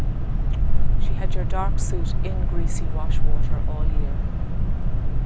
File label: mix Car